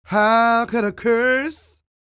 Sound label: CROON, Vocal Blues & Soul MELLOW